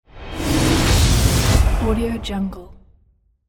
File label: magical ability